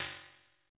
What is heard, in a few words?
Visco Space Drum; drum machines